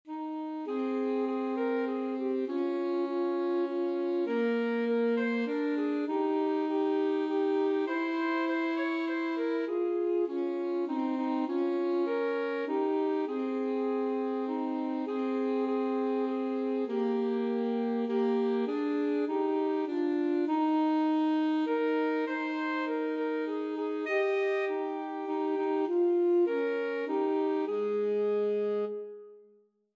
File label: alto saxophone duet